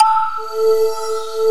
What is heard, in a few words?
SYNTHESIZER DIGI DECAY